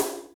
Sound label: foil, Ticks, Misc Percussion